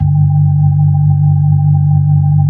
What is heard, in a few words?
B-3 Chorus